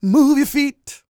SING, Vocal Planet R&B & Dance; ILIO

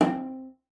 C4, CELLO FINGER; Xsample VOL-5

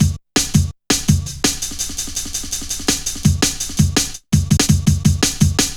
Fat Rolla, Jungle Drum Loops 166.5; Zero-G Jungle Warfare 1, 2 & 3

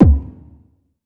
bass drum, Drum & Bass, 160 BPM; Ueberschall; Drum & Bass Resonance

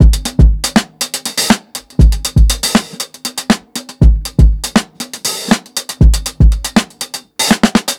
120 16TH GROOVE, DRUMS LOOPS; BASEMENT DRUMS VOL 2

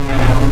1 Shot Laser C4; Digital Sound Factory Xtreme Lead

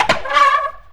SQUEAKY, household; AKAI Anime Sound FX

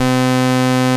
A#3, HYBRIDS; East West - Global Sounds

